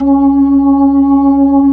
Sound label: B-3, Jazz; Roland LCDP10 Keys of the 60s and 70s 2